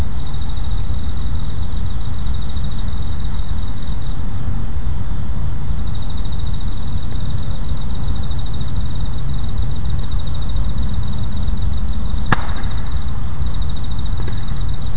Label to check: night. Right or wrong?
Right